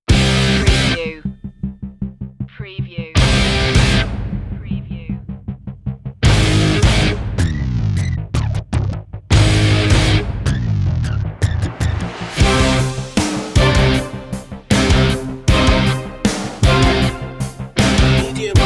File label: drama heavy alt